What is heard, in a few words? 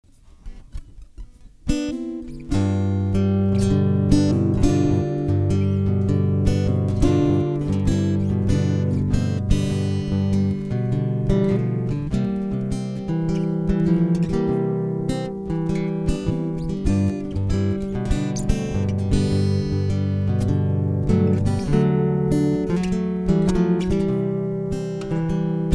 Blues In Thirds